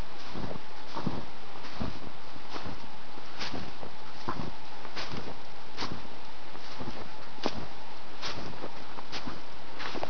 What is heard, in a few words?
snow walking